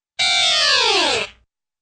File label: descending concertina